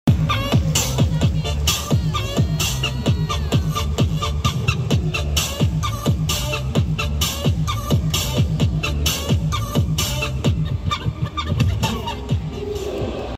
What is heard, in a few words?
cheerleader